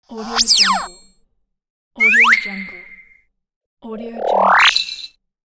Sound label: cartoon transition